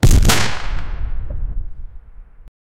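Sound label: realistic heavy handcannon, firearms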